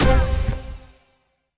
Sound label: Jingle, Invasion Unlocked; rayman legends the complete soundtrack gamerip 2013